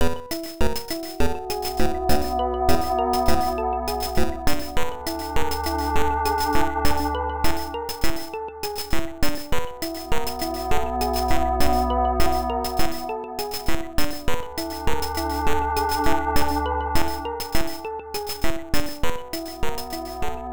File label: A Little Scary; Power Slave; PC-9801